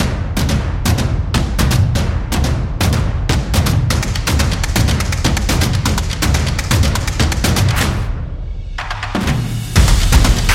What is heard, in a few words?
The Drum Beats